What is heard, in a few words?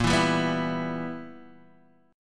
B Major 7th Suspended 4th Sharp 5th Bb